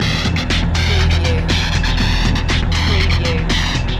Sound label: remix loop